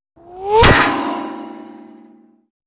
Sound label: Bullet with Whir Impa, metal